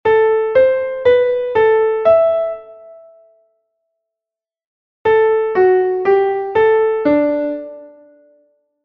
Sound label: rachmaninoff paganini; musicologia